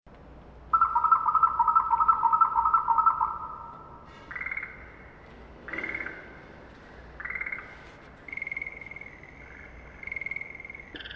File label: breeding voice